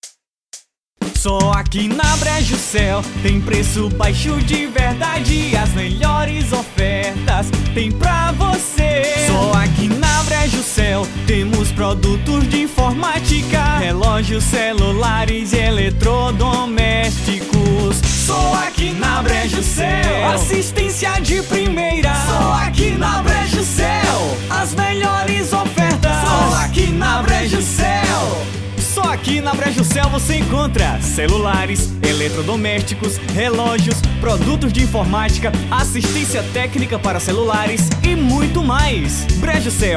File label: jingle; Brejo Cell; Studio R Music